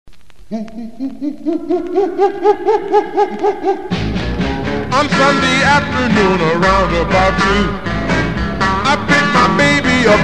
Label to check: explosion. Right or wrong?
Wrong